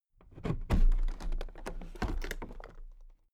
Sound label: toolbox open soft